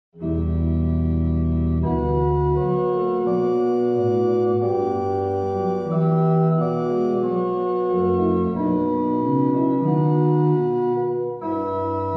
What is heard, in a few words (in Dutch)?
improvisatie psalm 5 koraal; krewerd